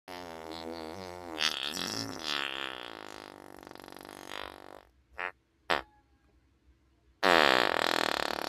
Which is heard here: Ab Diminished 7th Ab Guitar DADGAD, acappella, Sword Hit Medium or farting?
farting